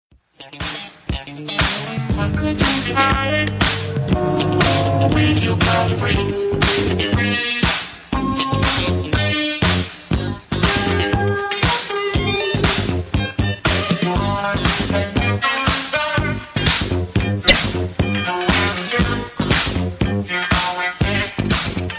Funk; meloboom

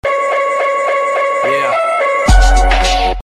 Sms Ring

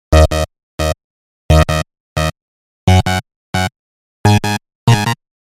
Buzzning

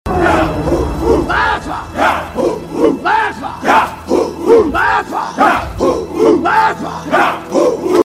chant

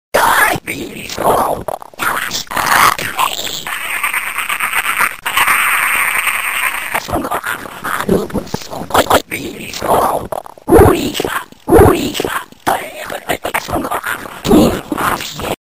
Alien Comunication

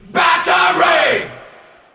Battery

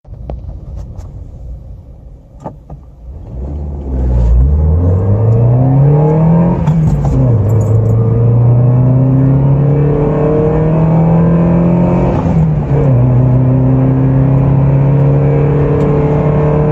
4EFE 1300CC 16 VALVE

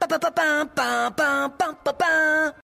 Fanfare; Prompto

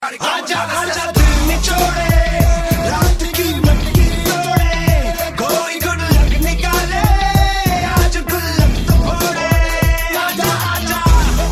Hip Hop

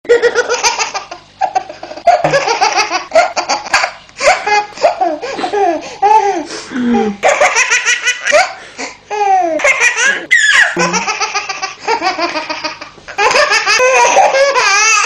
Laughing Baby Funny

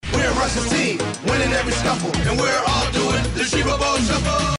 Shiva Bowl Shuffle

